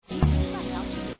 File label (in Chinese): yueqi gudong sheng